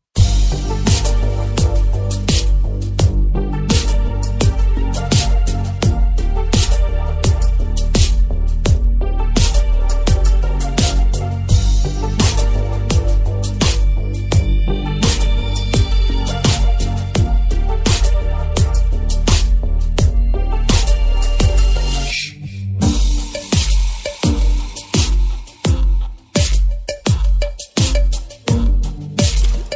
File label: Very Sneaky